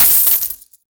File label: Fist Claw Coin Impact; Incarnon